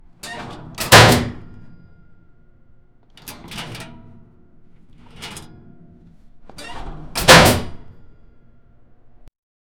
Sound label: metal